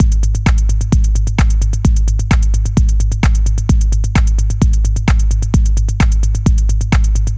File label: Hard Synthetic Groove, 130 Bpm